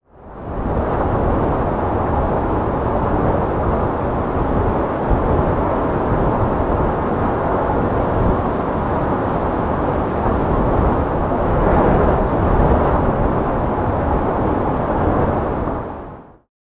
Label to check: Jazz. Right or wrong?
Wrong